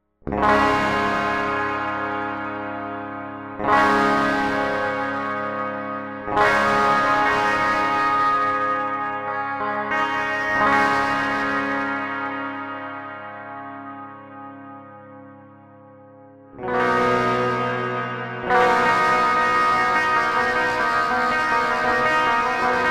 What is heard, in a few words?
distortion sample